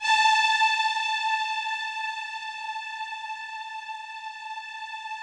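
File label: Rough Strings A4, Yamaha VP-1; Music Store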